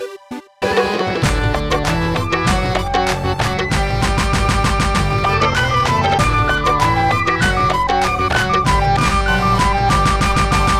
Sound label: Scoreboard Loop; Osteotic Bypass